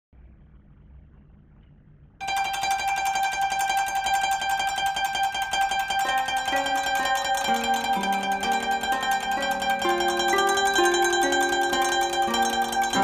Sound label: koto